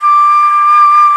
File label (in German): FLOTE SOFT; FLOETE; Best Service; Alpine Volksmusik